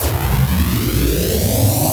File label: reverse, FX; Vengeance Samples